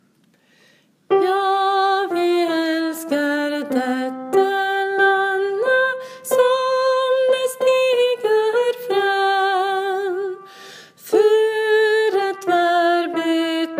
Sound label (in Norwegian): tenor; ja-vi-elsker; åfjord